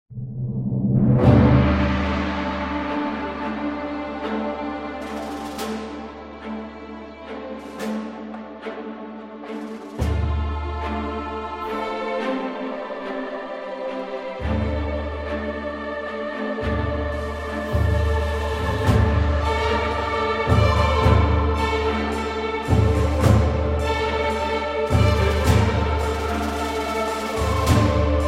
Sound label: battle epic; freeciv